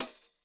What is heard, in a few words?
tamb hit